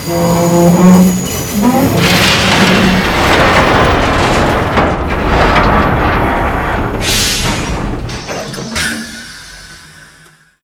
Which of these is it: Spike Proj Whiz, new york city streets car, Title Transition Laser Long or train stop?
train stop